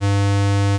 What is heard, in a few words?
Square wave